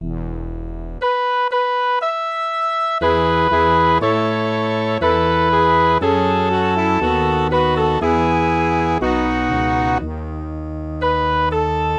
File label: MIDI